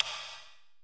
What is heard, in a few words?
switch on